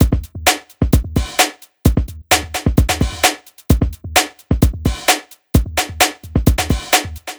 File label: 130 drum loops; Breakitt; M8; Electronisounds